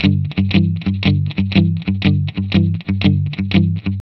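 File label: 120 G, Vintage Blues Guitar rhythm; USB Soundscan vol.22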